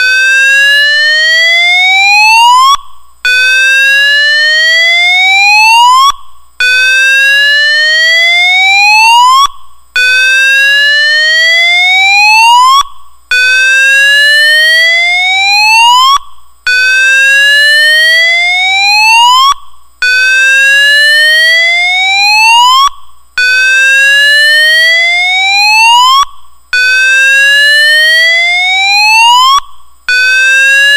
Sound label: special warning